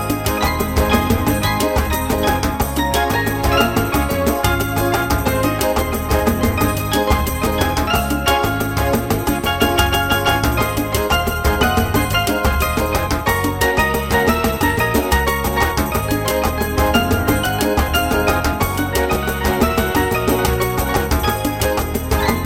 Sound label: Beat Groove